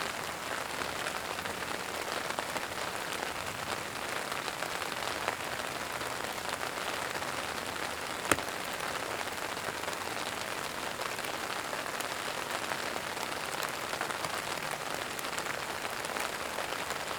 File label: White Noise Rain Under Shelter Strong Rai